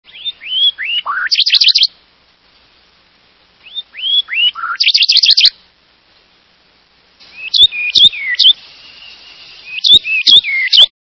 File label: Blackburnian Warbler